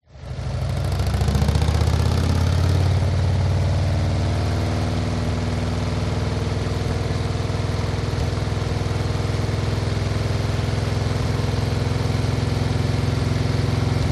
TRANSPORTATION MOTORCYCLE Harley Accelerate Slowly Long